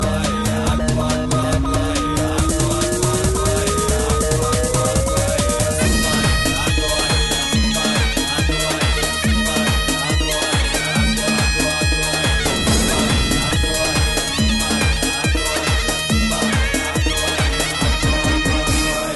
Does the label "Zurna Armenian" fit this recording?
Yes